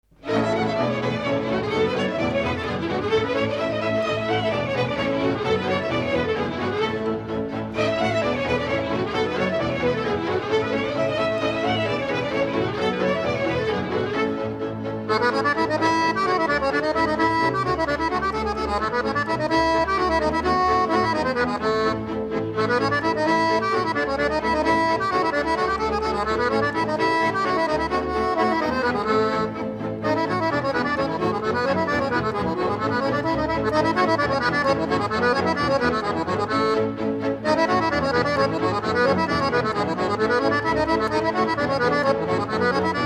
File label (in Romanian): acordeon, Barbunc